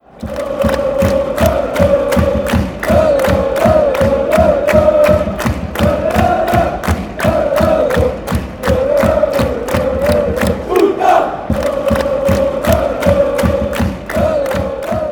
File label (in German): torhymne and fangesaenge; vfb stuttgart